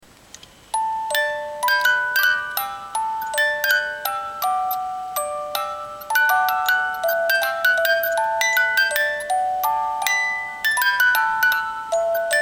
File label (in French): boite musique 18 lames